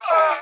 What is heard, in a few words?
Ratcaller Damage